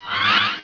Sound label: Mine Say; Virus: The Game